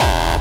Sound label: BD Distortion, Bassdrums; Vengeance Samples